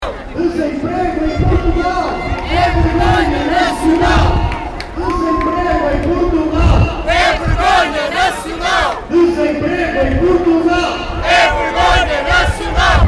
gritos de protesto

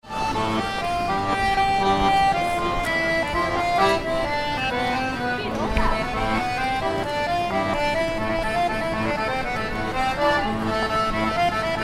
música concertina

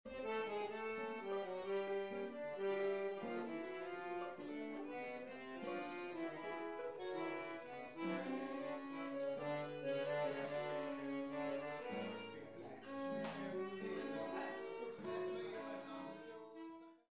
Afinar instrumentos

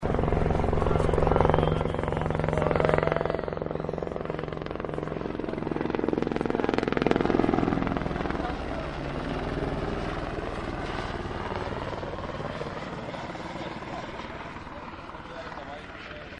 heli

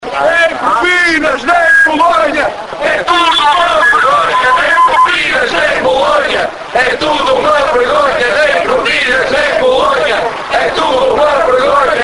manif gritos

